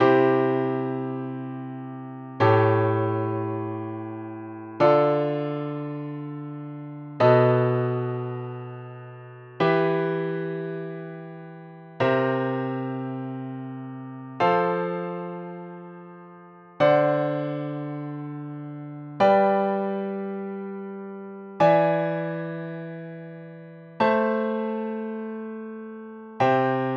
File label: dominant secondary